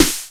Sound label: Snare, Roland JX-305